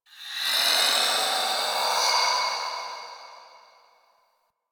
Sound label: Texture Interior Large